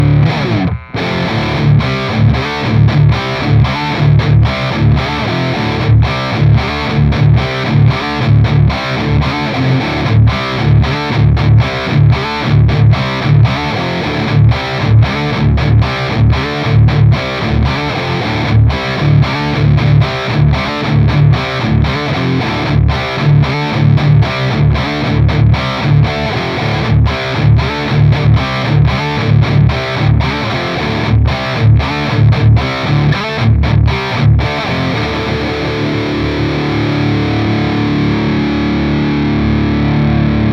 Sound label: Behringer 1273 NO EQ